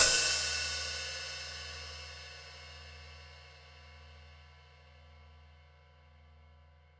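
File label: hat open, Drums Percussion, Kit 1 OH Mic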